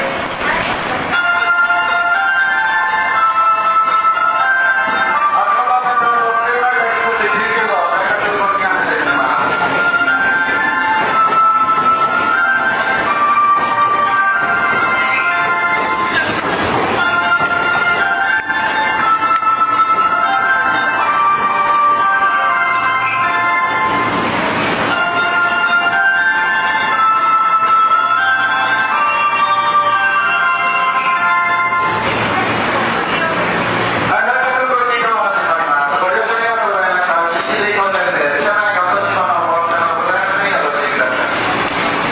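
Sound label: Departure Melody, 成田エクスプレス発車; 埼京線・湘南新宿ライン; 池袋・大宮方面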